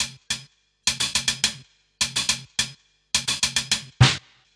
105 Drums Top

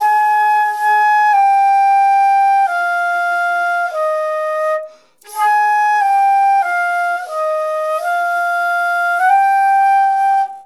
110 F Despondant Solo Flute

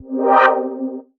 Chord Hit, C

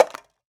Perc

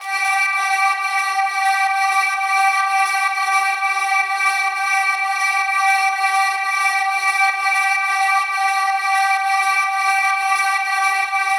128 Gmin Vocal Fx

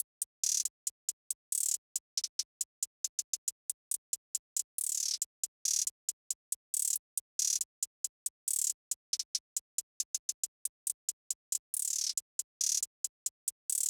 Hihat Texture Bm, 138

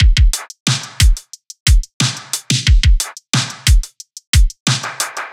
90 drum loop full; falcore

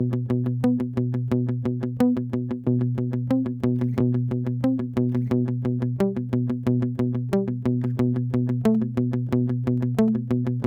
90 Bm Guitar DRY; Hymnic

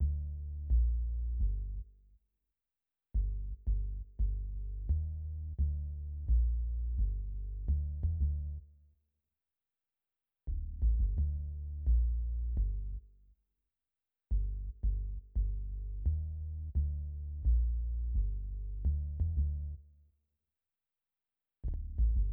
Bass

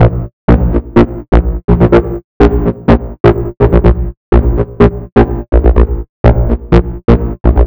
125bpm Synth A-

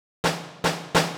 106bpm DrumFill

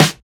sleepy snare

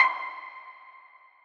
Same Blip

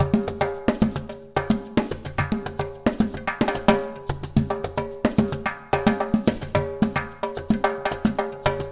Percussion Ensemble 110BPM Ensemble Natural

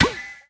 Dirty Percussion

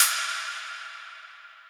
ride short

